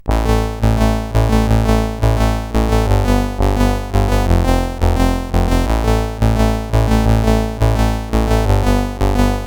Out of Boom sid CSG8580R5

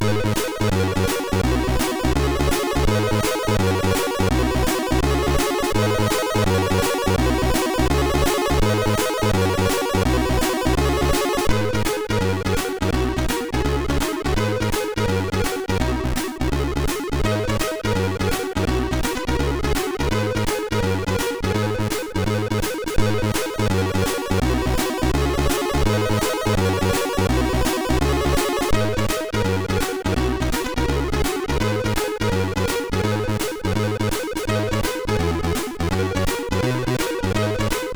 sid, MOS6581R3; Rorschach

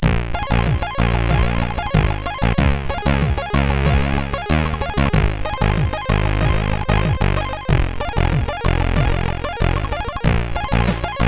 Bahamas, sid CSG8580R5

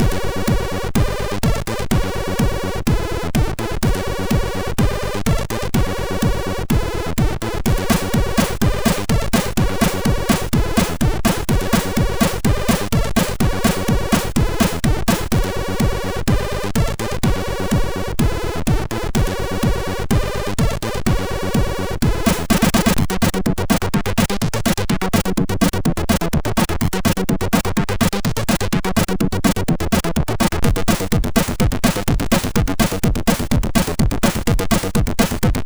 Lame, MOS6581R2

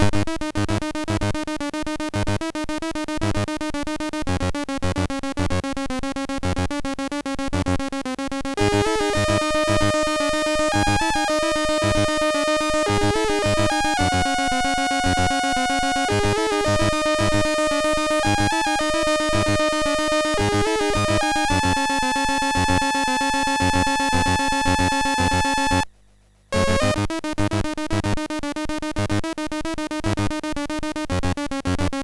BASIC, MOS6581R2; sid

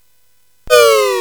Dark Castle, CSG8580R5

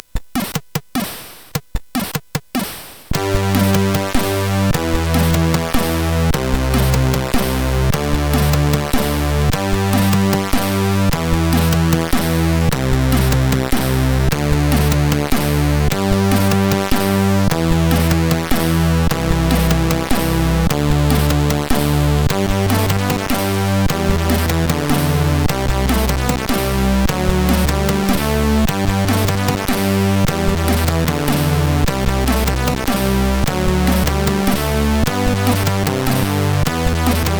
High Score, sid CSG8580

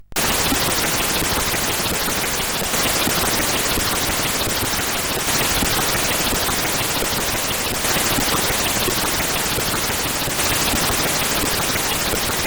intro, CSG8580R5; Kiwi Magic

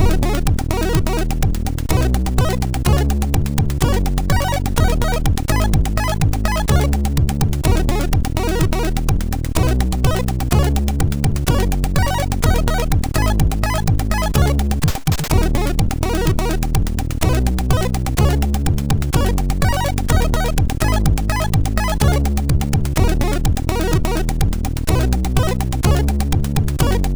sid MOS6581R2; Llama

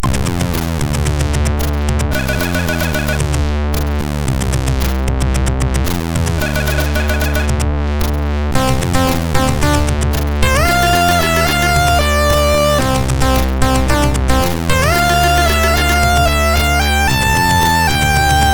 sid MOS6581R2

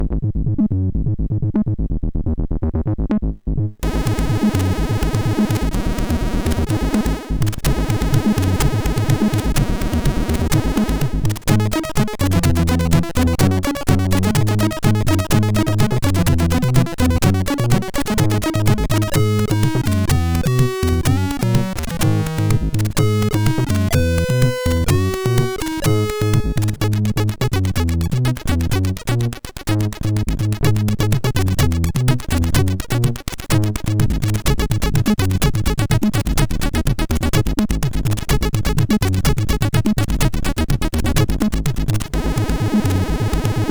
note sid MOS6581R4; Scrap Iron

